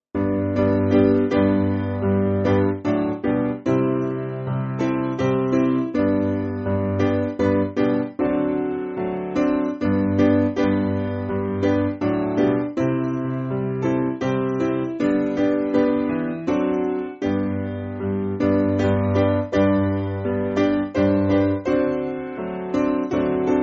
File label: Im Pressing On SPiano; Gabriel